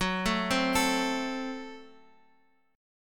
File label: Gb Diminished, down, Guitar, Open F; arpeggiated; JGuitar